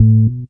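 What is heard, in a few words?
Bass Riff Bass, Loungin House; Equipped Music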